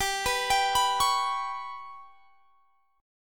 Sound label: G Major Flat 5th chord; GMb5; arpeggiated; x,22,21,24,24,21